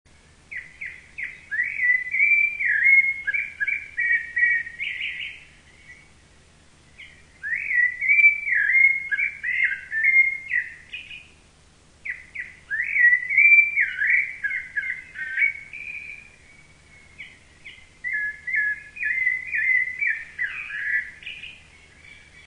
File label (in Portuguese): laranjeira, cantos sabias; ilhabela